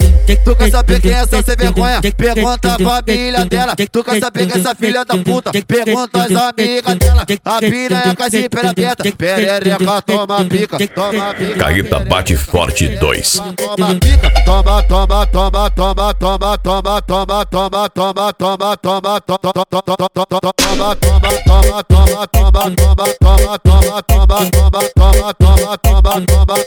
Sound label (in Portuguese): funk sertanejo